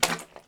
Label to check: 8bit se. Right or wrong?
Wrong